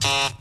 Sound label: squawk, Guitar; Notes